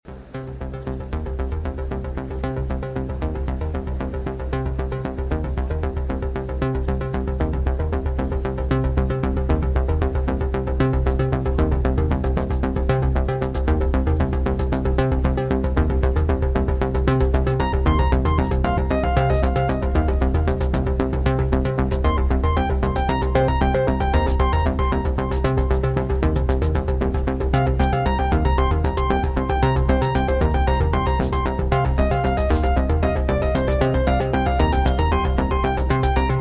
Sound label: Arpegiator (Live)